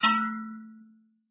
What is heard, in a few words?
Ting; Ki11er Clutter